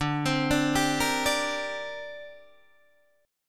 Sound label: D 7th Suspended 4th Sharp 5th down Guitar Open D